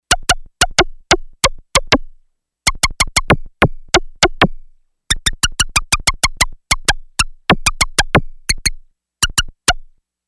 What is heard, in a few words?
Mouse Tom, Yamaha DX11; Factory Preset Voices